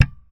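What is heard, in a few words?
thumb, bass; Xsample VOL-1; SLAP+THUMB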